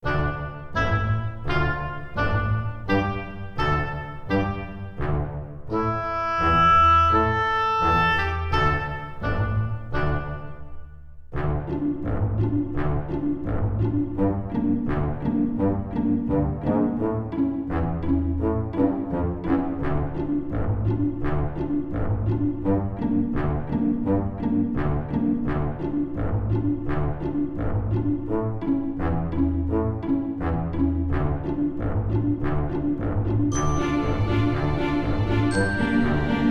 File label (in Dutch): karaoke